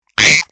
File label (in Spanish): grito